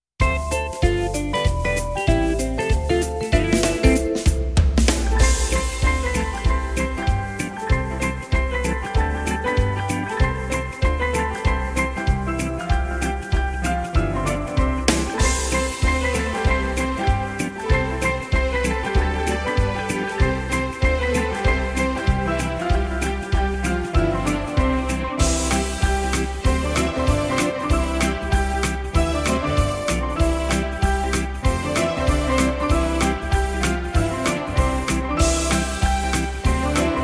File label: quickstep